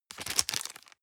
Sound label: plastic bag small turn medium